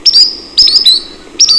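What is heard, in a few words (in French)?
chevalier solitaire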